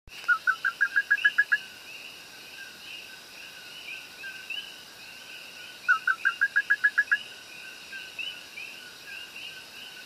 eleutherodactylus cooki juncos puerto rico; guajon; Its strange calls echo in the caves and / caverns; caves and caverns that it usually inhabits, in the south-eastern quadrant of the island; West Indian

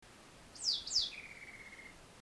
Vireo modestus Barbecue Bottom Jamaica; West Indian

